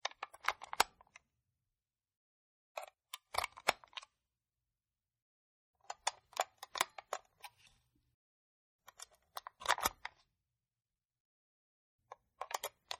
USB Key Insert, Usb Key Being Inserted Into The Computer; 7 Takes; Computers (Sound Effects:Electronics)